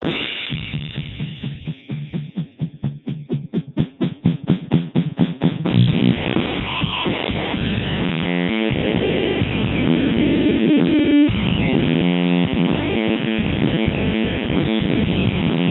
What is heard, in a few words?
generic rock 3200; codec2